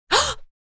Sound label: Female sudden gasp